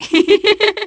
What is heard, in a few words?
laugh; WII; MARIO KART WII; rosalina